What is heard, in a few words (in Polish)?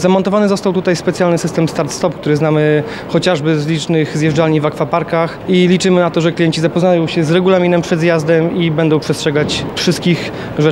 tuba